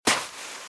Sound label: Footsteps Sand Dry Walk Left; RadwinIsland